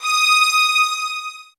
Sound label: SOFT VIOLINS